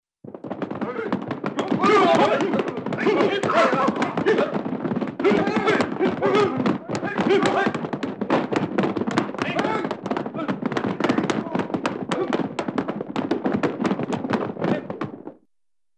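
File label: Funny Group Of Men Run with grunts & Vocals, Cartoon; Hollywood Edge; Cartoon Trax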